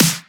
Clap Hard; samples

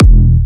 Big Kick; samples

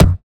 Tom D; samples

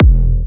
Big Kick; samples

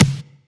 Tom F#; samples

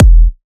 Punchy Kick; samples

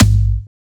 Tom G#; samples

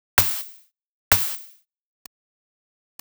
Clap Snare

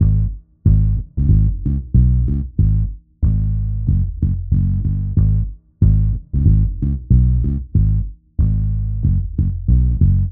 Bpm93 Ab Hustlin Funk Bass

